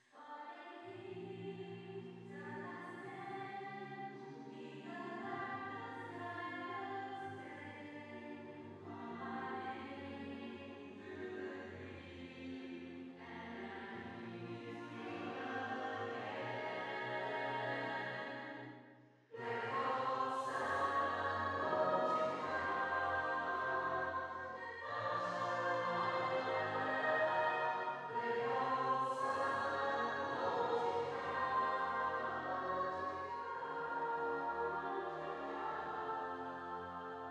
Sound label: Remote Choir Verb Hall Solo